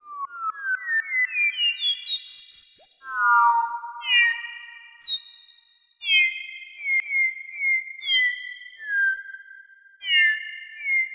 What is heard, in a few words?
Nightingale; croc; AMBI BIRD